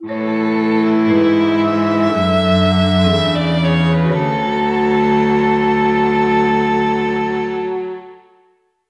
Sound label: End Jingle; mario tennis ultra smash 2015 wii u gamerip